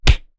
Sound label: Fight Impact; SoundsCrate